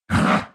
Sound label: hit sound